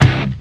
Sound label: distored strum, Guitar; Notes